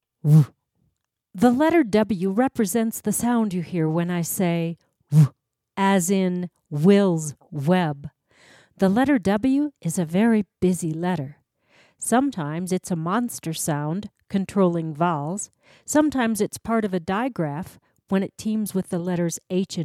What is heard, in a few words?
Guiro